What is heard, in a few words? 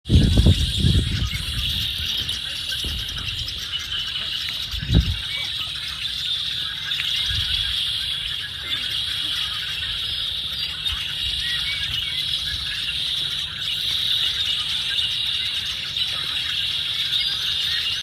Redwinged Blackbirds